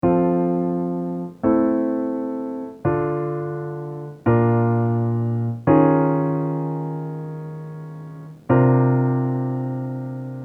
Chord Progression F

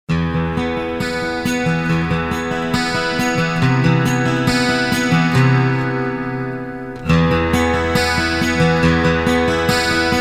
right side with effect

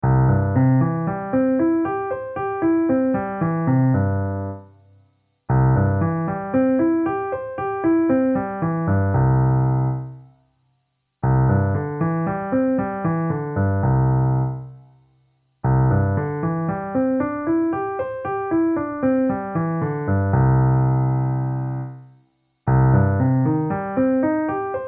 LH Arpeggio Shapes

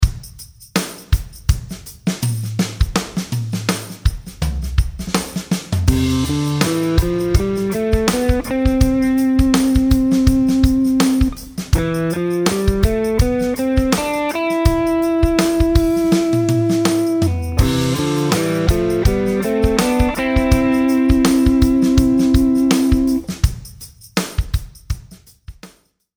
C MAJOR SCALE 3RD ABOVE BOTH PARTS

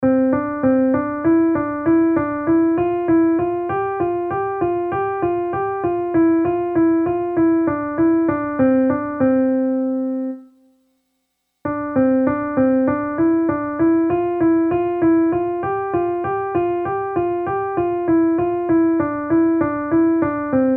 Weak Finger exercises

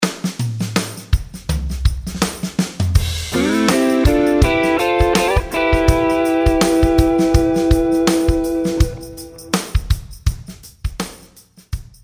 ORIGINAL MELODY PLUS BOTH HARMONIES

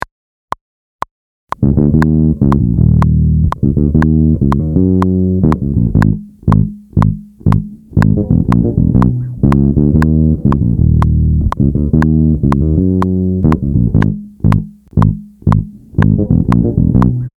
Ahead of the beat with click

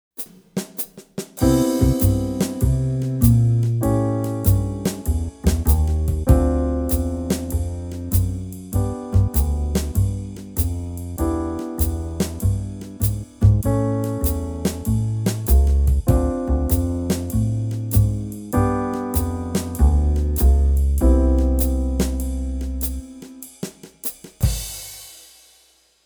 No Bass

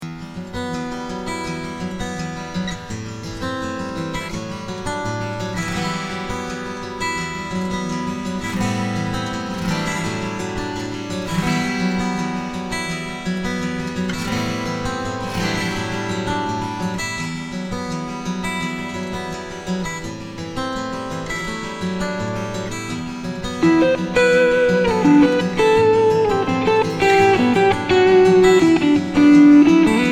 TAG1E ALL PARTS WITH LEAD GTRS; Yamaha TAG1E; guitars

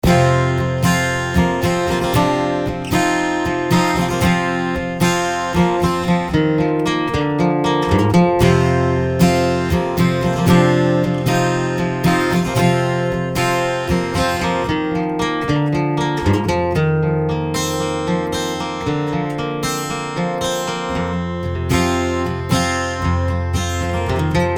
both guitars with external mics

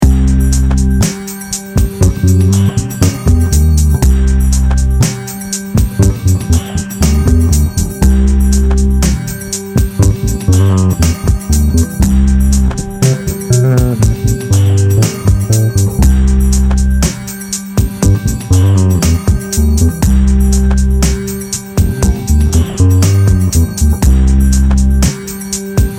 Lydian Groove, bass; A Lydian groove can emphasize the sharped fourth that gives this mode its flavor.

